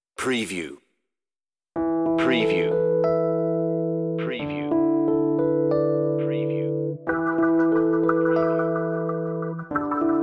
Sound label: on-hold music; Media Music Now